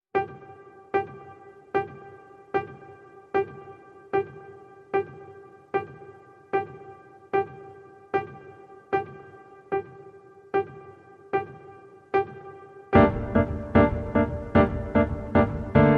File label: Piano Only